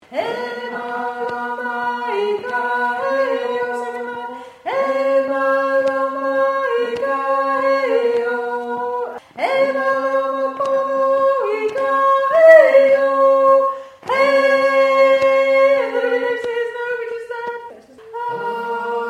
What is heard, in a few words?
Tenor